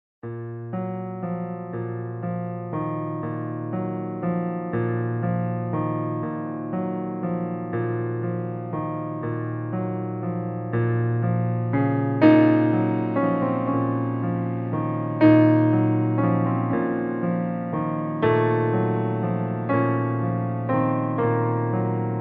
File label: Horror Theme